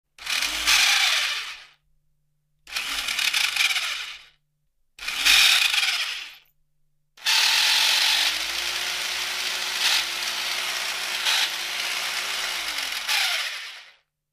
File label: Saw Saber Broken; On, Strained Runs, Off, Does Not Cut Wood